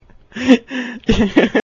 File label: Giggle